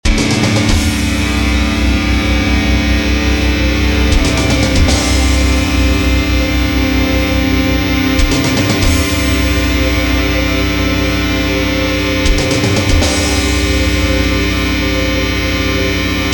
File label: DRONE ATTITUDE